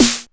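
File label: KORG Electribe SX snare drum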